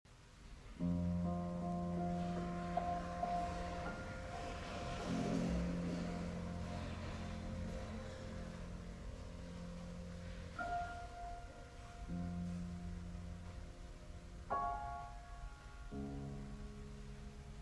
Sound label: Piano Sonata No. 29 in B-Flat Major, Introduzione. Largo; Op. 106; Hammerklavier; Fuga